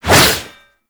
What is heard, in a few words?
Void Heavy Scythe Whoosh; Warframe; Duviri; Incarnon Adapters; Melee